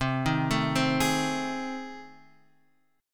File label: A Minor Major 7th Flat 5th